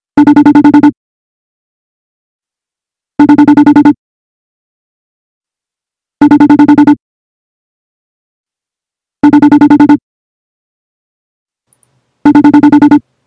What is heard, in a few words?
facetime ringtone outgoing